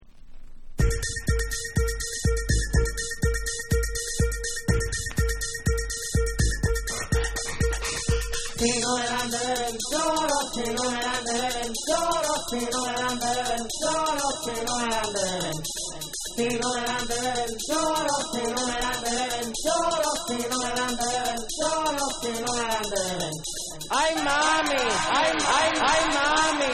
Acapella

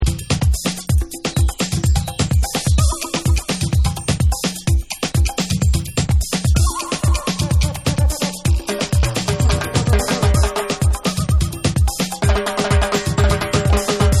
BRAZIL HORNS Beats

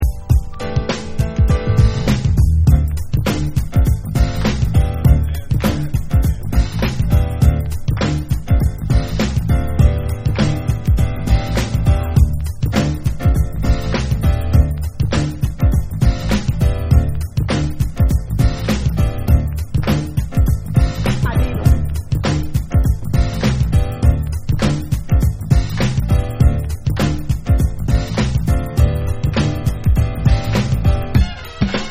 GROOVE Piano Version

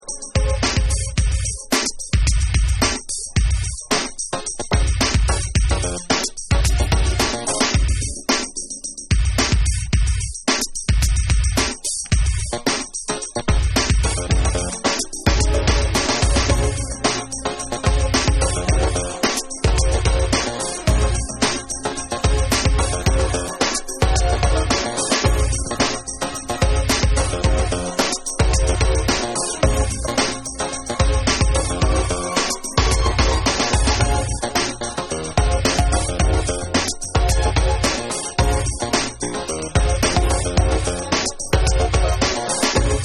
Hypnotic Instrumental

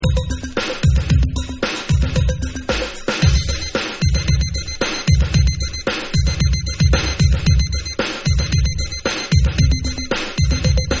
Bonus Beats

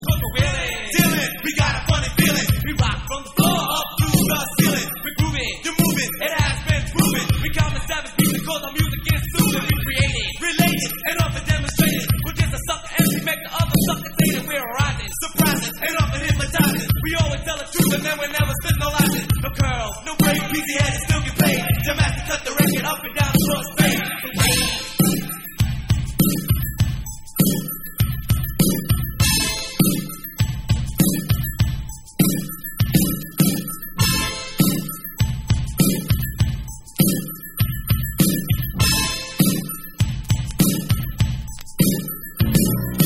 Vocal Dub Version

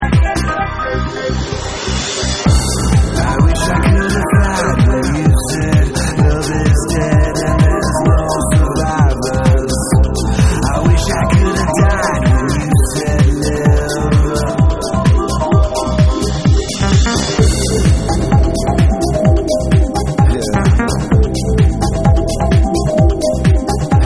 Vocal Mix

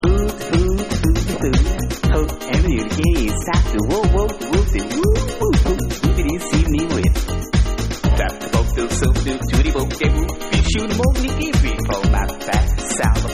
Techno Jazz Mix

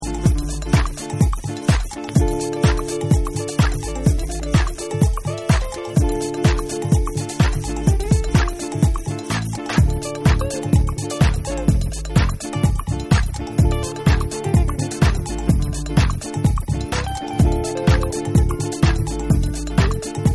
Acoustic Mix

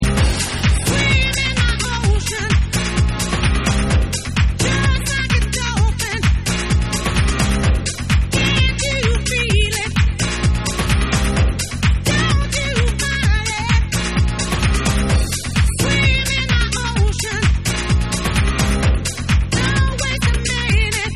Stereo Vocal Mix

